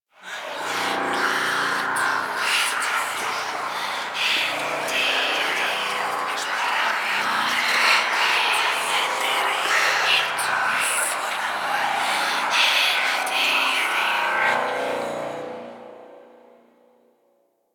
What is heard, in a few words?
Whispers High Pitch; Warframe; Ambience; Entrati